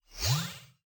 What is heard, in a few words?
designer appear; CrossCode